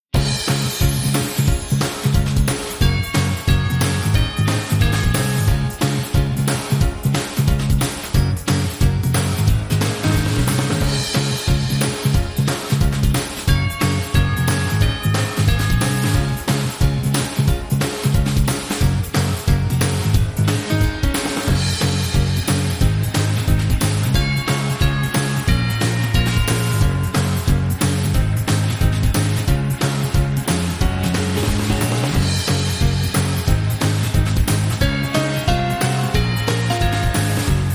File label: Joyful Jingle Bells